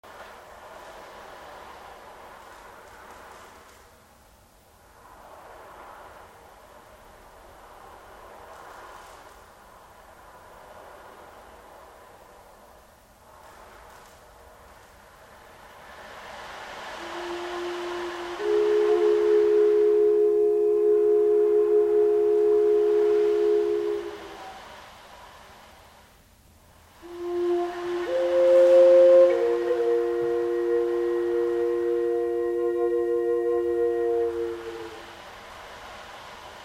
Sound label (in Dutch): ocean drum en native american flute, klankhealing; roelcrabbe